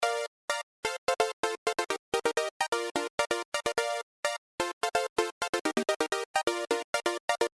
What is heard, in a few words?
BackBeat